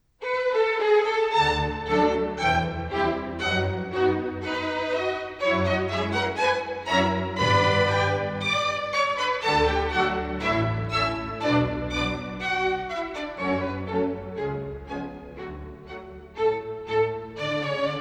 Symphony No1 Op25 Classical; Prokofiev; Steinberg

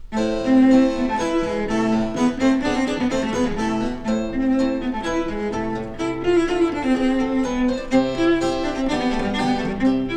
Cello Sonata; Vivaldi; Cello Sonata No3 RV43; Janigro

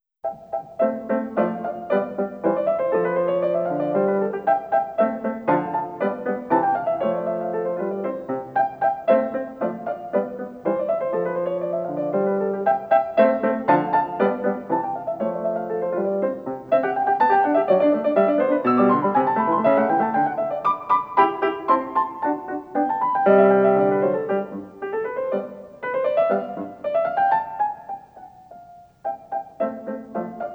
Piano Sonata; Mozart; Piano Sonata No15 K545

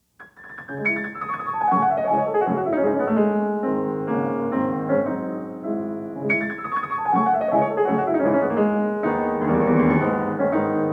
Preludes; Chopin

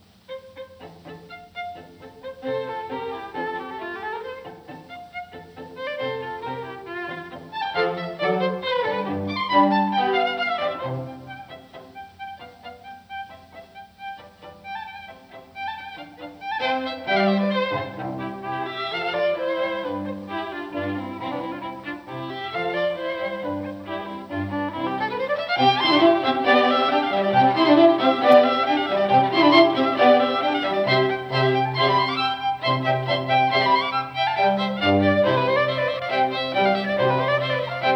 String Quartet Serenade; Haydn; Op3 No5 Hob3-17; Pro Arte String Quartet

